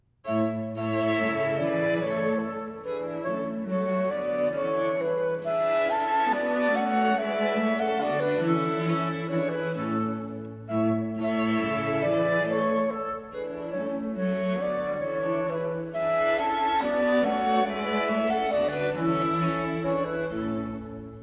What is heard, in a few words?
Clarinet Quintet In A, Menuetto; Mozart; K 581; Stadler